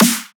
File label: Snare